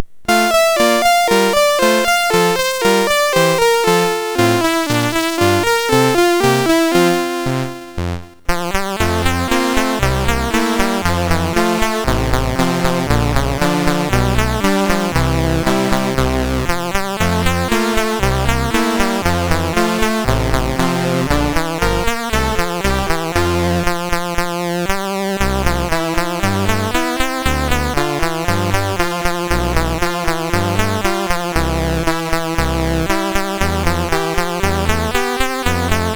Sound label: Song Sergeant and Chorus Police, MOS6581R2; Pirates of Penzance